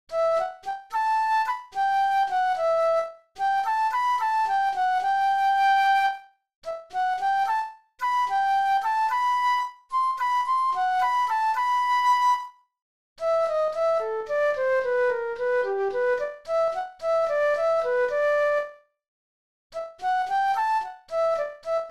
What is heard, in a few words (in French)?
pont piano